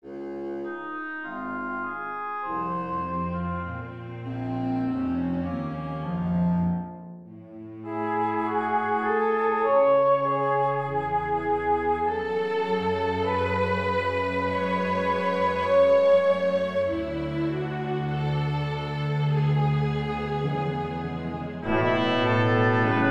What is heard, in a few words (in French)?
majesteux